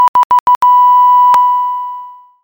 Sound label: OSCIL; cyberaz